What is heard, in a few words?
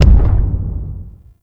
Distant Step; Enemies; Steps; Warframe; Corpus; Arachnoid Camper